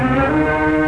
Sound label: mummy restore up